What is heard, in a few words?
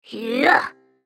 effort; BadRobotAmumu